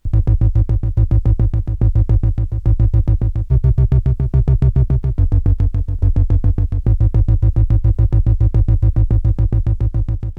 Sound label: bass env reps; OSCar